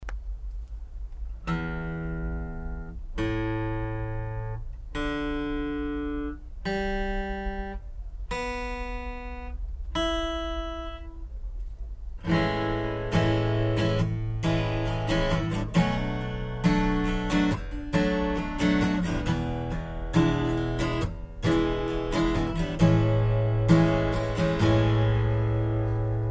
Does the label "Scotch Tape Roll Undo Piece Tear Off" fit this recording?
No